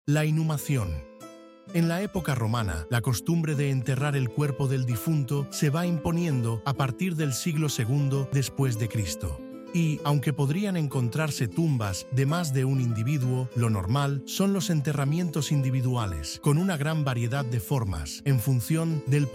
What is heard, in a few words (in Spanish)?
audioguia música; museo arqueologico